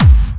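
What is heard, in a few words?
BD Clubby, bass drum; VEC1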